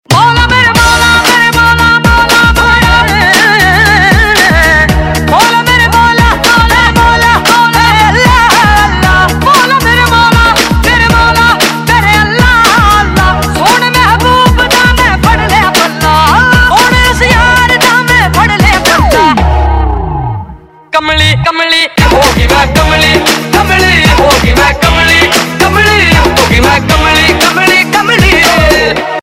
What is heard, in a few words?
Ringtone, Punjabi